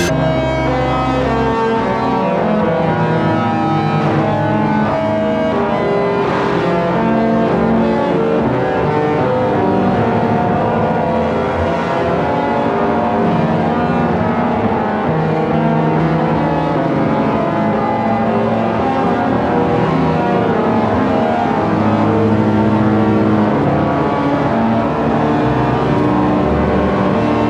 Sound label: Several Hands on Our Piano